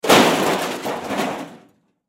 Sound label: Crash Metal Garbage Can Fall Over; Crashes; Blastwave